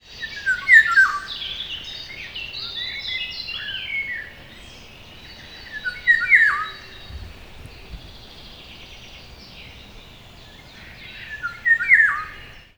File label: Pirol Oriolus oriolus; Karl-Heinz Frommelt; Tierstimmenarchiv des Museums fuer Naturkunde Berlin